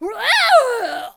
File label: Pirate Queen Death Vocals